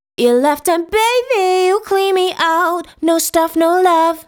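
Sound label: POP DANCE, 124, vocal, no love, E minor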